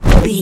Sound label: casting charge whoosh buildup